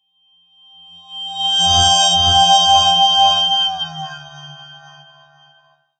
fly byte night, Atmospherics

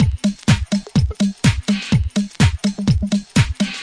plucky garage beat full, drum loops and breaks

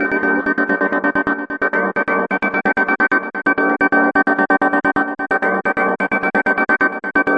130 sun rise pad arpeggio; SYNTH AND ELECTRONIC LOOPS

